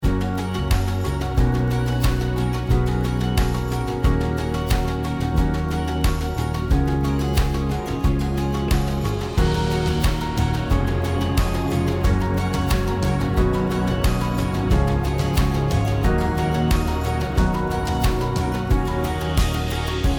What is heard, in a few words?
folk